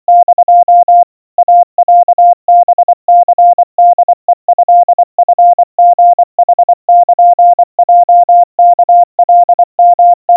iroha 80 cpm 700 Hz Crispy, Morse

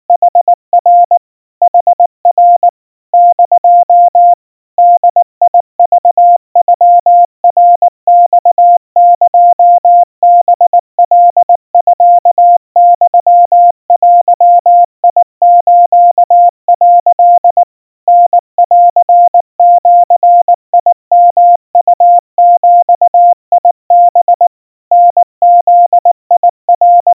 kamyu 70 cpm 700 Hz, Morse

